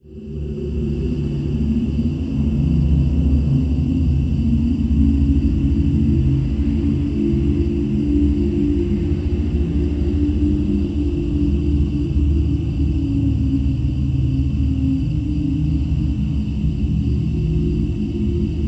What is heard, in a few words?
Halloween Ambience